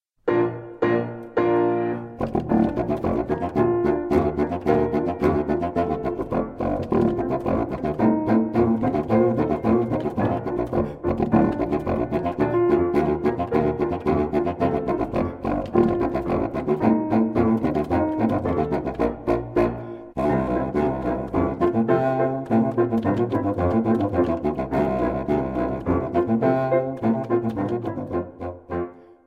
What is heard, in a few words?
Clarinet Polka